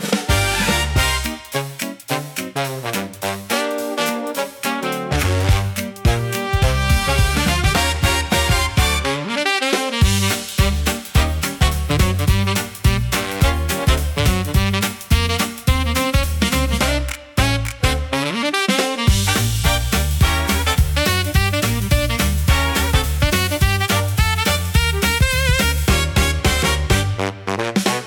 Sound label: electro swing pop for trendy bouncing rhythm